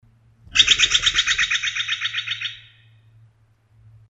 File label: Stellars Jay